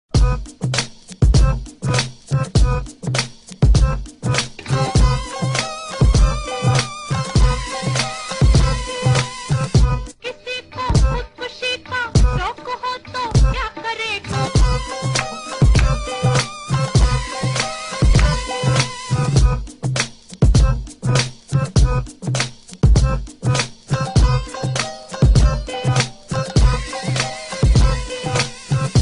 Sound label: black beat, hip hop